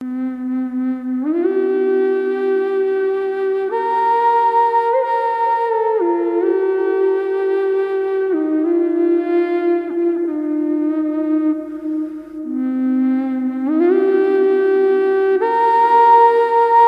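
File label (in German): Cis mit reverb, drone flute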